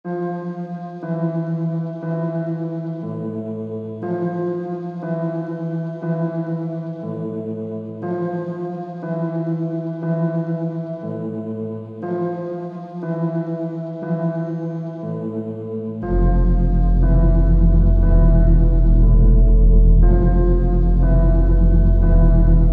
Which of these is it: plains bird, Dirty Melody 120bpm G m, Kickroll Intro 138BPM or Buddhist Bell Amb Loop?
Dirty Melody 120bpm G m